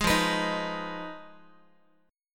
F Minor 7th Flat 5th F down Guitar; strummed; JGuitar

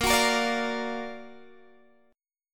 F sharp Augmented Major 7th A sharp, chord

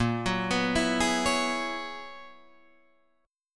Gb 11th Bb

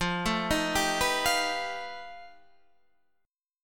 F 9th Sharp 11th F, chord; arpeggiated; JGuitar

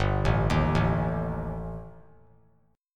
G Suspended 2nd Sharp 5th; Gsus2#5 arpeggiated; JGuitar

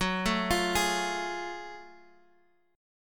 F sharp Minor Major 9th, Guitar Drop D

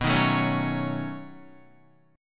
B 9th B down Guitar; B9 strummed; JGuitar

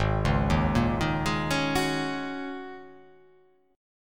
G sharp 9th Suspended 4th G sharp

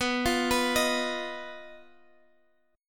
B-Major Flat 5th B down Guitar Standard; arpeggiated; JGuitar

